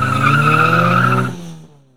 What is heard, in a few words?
taunt; tiny